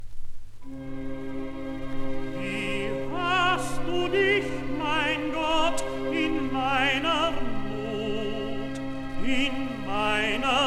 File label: recitativo; bach; bwv21